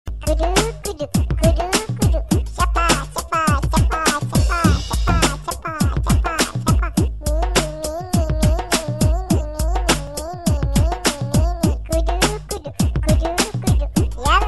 Cute Baby Tone Ringtone

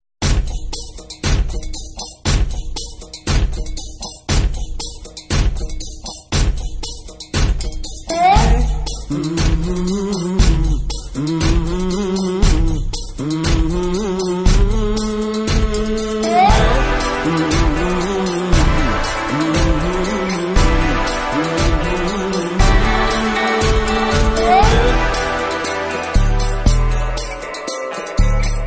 Start Musik Telugu Ringtone

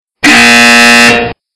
Jail Door Ringtone

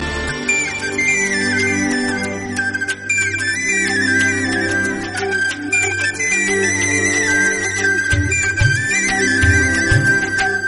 Flute BGM Telugu Ringtone

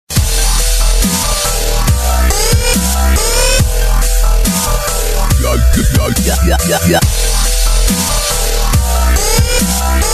High Power Ringtone

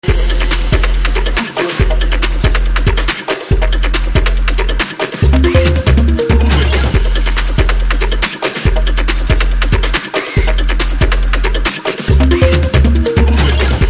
BGM Telugu Ringtone

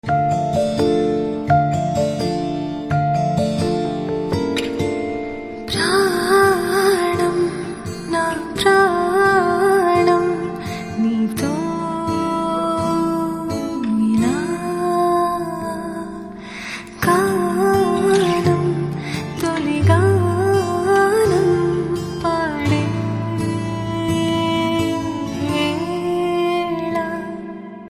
Telugu Ringtone

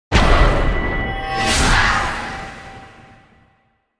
Scary Ringtone

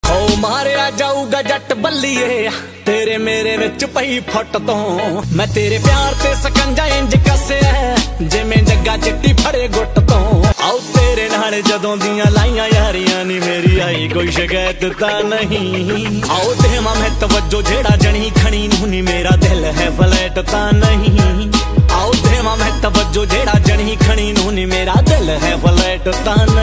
Punjabi Ringtone

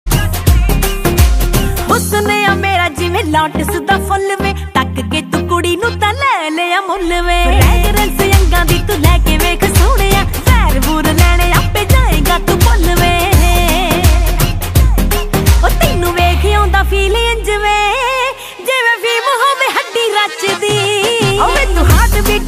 Heartbeat Punjabi Ringtone

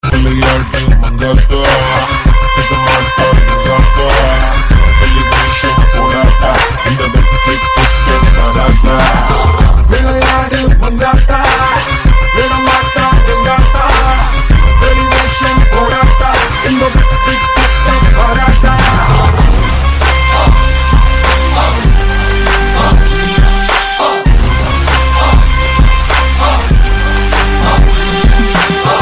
Tamil Ringtone; Mankatha

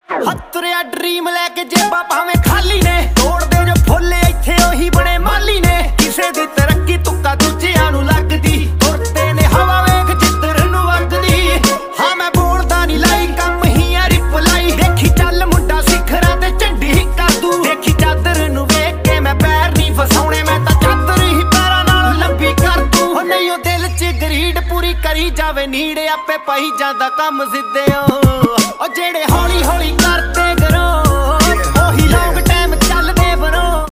Dance Punjabi Ringtone

